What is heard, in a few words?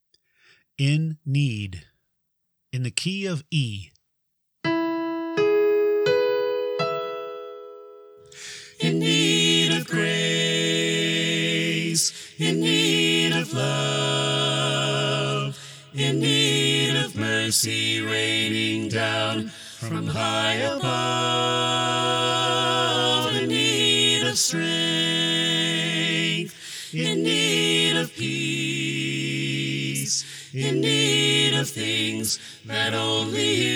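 In Need Soprano Left, choir part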